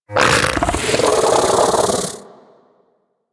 bug vocal mount; Warframe; Hunting Critters; Inf K Drive Bug

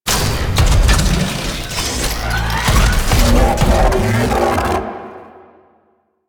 Void Rig Spawn; Warframe; Entrati; Necramech Hack

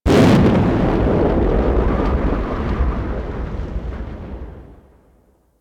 Mining Explosions, Asteroid; Ambience; Warframe; Grineer Asteroid

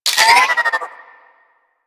Space Port Cargo Scanned Beep; Venus; Objective Rooms

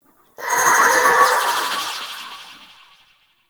Wraith Pre Death Fly Up; Enemies; Warframe; Zariman

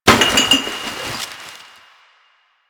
garbage bag destroy

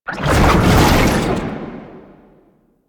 Perfect Heavy Attack Activate; Warframe; Weapons; Melee

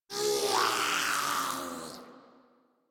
Attack Roar; en; Warframe; Grineer; Ghoul

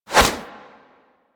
Punch Whoosh; Warframe; Weapons; Infested; Inf Kick Punch

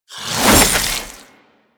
Inf Boomerang Whoosh B; Warframe; Weapons; Infested

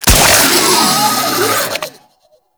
Shoulder Destroy; Enemies; Infested; Warframe; Femur

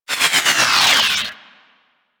Survivors Upload Power Down; Warframe; Infested Eris; Encounters

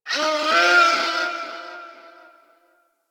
forest distant creature medium cry; Ambience; Warframe; Grineer Forest

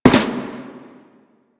Step Default; Warframe; The New War; Veso

